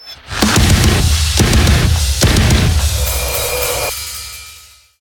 transition; Doom

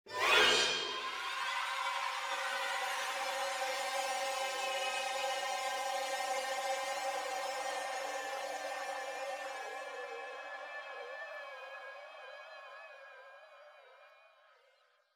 shock riser; Joe's Diner

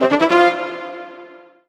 Orchestral Brass Positive; MUSIC EFFECT; startide

